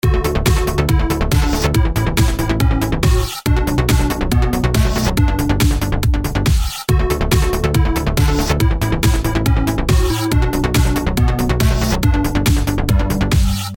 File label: first electronic song